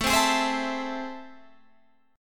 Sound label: A Minor Major 7th, down, Guitar, Open G